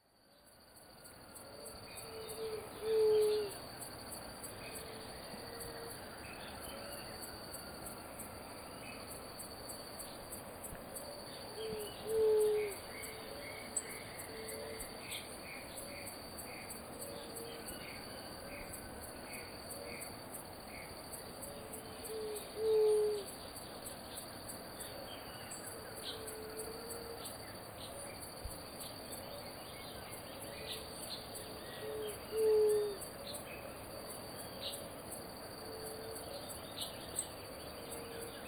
Juriti pupu Leptotila verreauxi; Jacauna

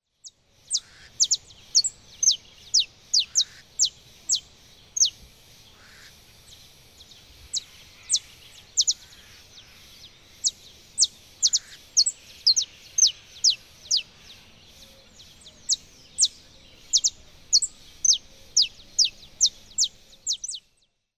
Canário da terra Sicalis flaveola; Jacauna

